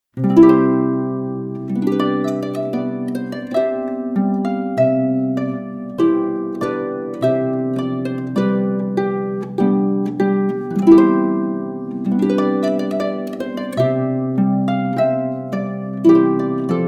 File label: trumpet voluntary; Trumpet Voluntary by Jeremiah Clark; Pedal Harp